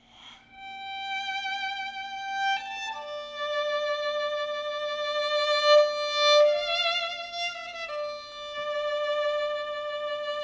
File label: Violin